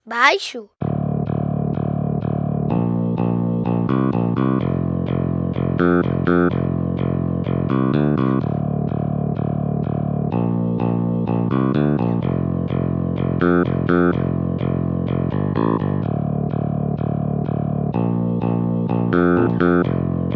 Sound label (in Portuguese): BAIXO